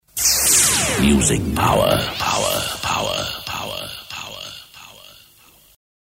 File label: Music Power Power Power; JINGLES